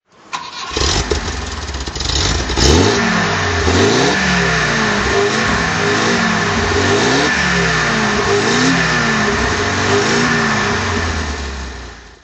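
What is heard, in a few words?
volvo p1800es throttle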